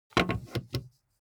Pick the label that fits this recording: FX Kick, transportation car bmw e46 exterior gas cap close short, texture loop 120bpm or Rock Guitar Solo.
transportation car bmw e46 exterior gas cap close short